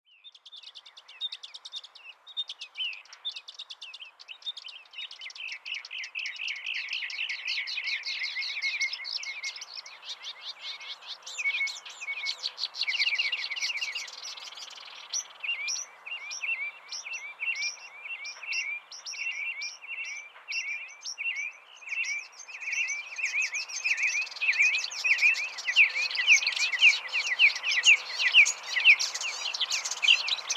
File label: panoramic birds